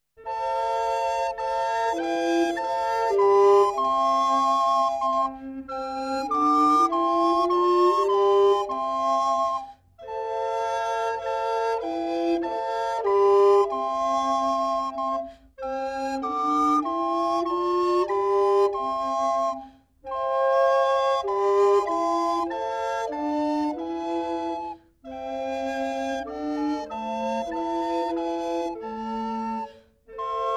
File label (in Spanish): flauta pico